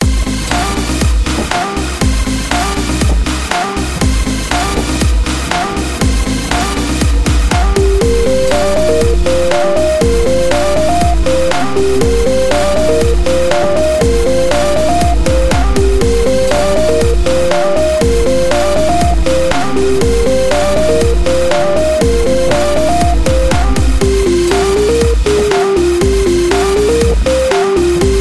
dance 90s electro lofi joy

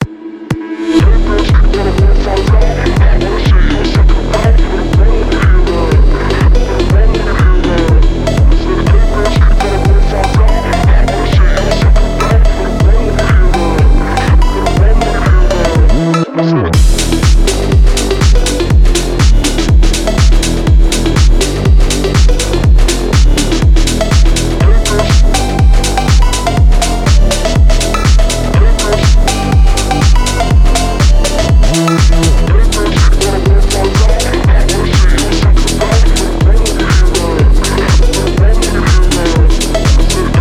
drift phonk house

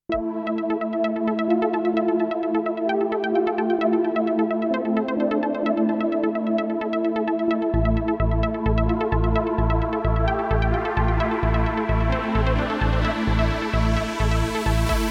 molecular electronic background music

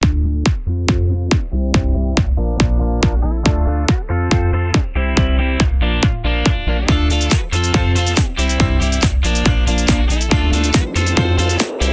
electro summer positive party

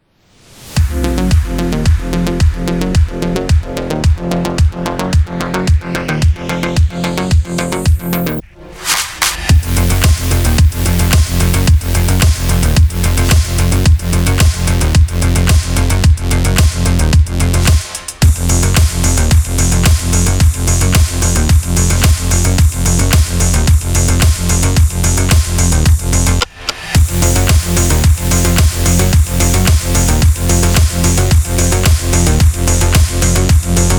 cyberpunk music

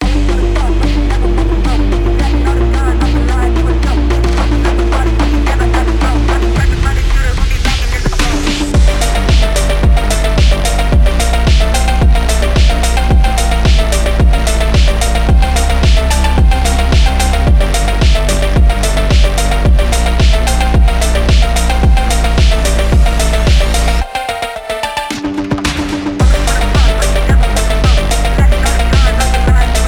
hard edm phonk gaming music

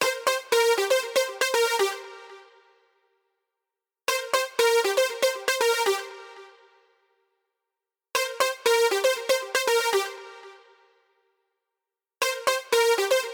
electro

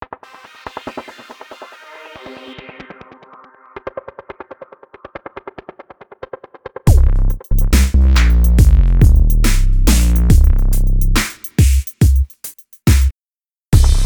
fashion relaxed design smoky slow dynamic music

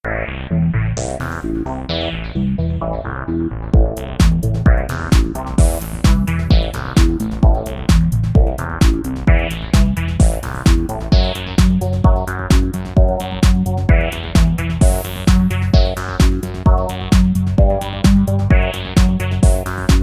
electro mix

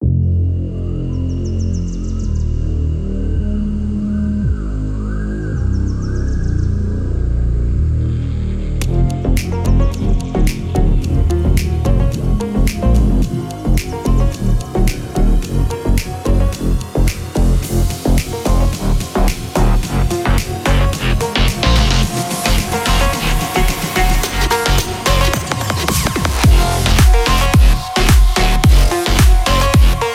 bass house music

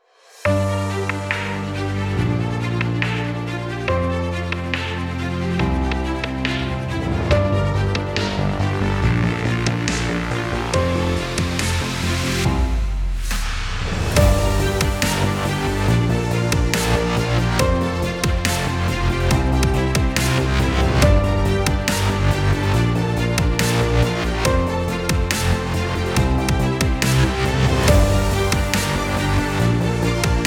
dubstep

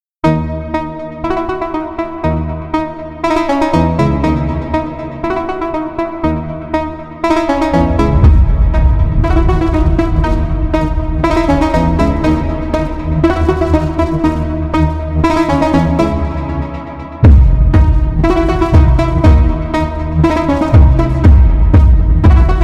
phonk

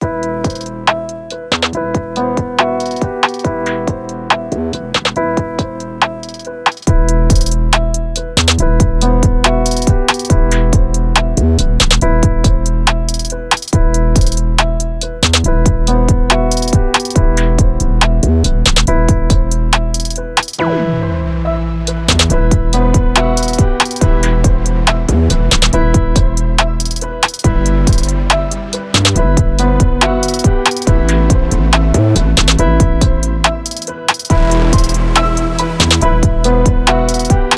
trap beat

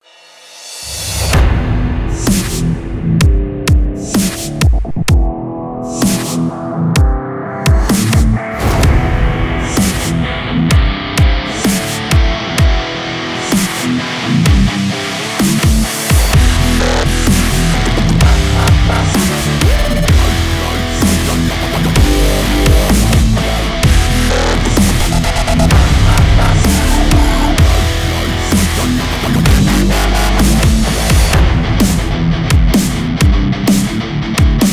epic metal dubstep cinematic

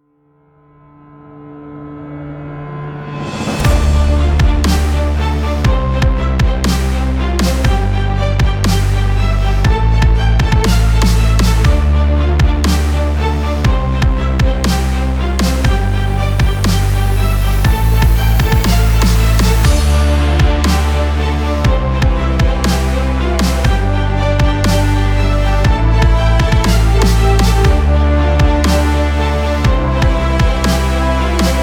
dubstep epic

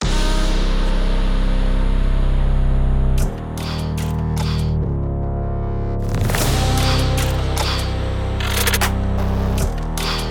aggressive punch

